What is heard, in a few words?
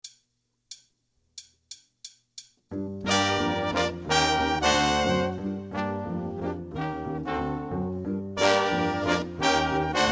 piano drums